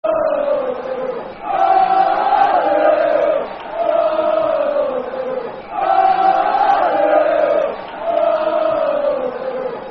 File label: Motherwell, Motherwell [Football Chant (Soccer Song)]